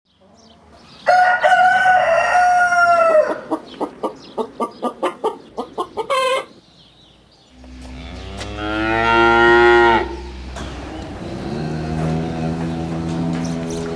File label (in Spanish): Bio Fonia Establo De Animales Finca Palmira